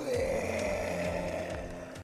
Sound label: Roar; Kong; KKotA